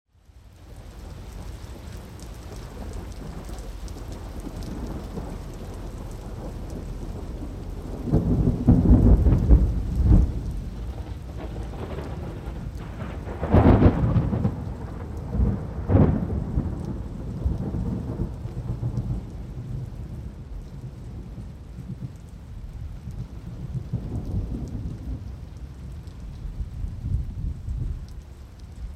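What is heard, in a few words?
continuous rumbling against background of rain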